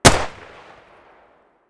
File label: m1911 muzzle mono distant, weapons; colt